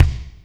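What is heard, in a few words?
kick Lo Wood HL, Alesis D4; 300 Drum Machines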